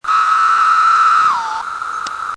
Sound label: magicicada; m sdecim wf